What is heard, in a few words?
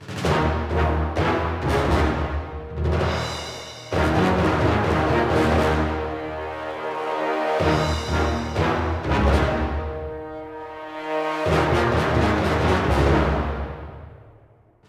Tension Loop; Might and Magic Clash of Heroes